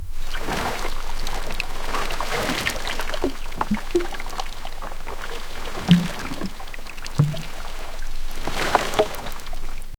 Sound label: wooden creaks of canoe, cloth rustling